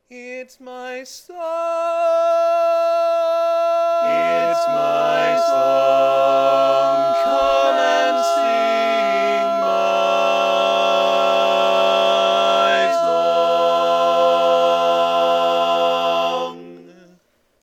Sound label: Bass, barbershop tags